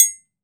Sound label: triangle; TRIANGLE2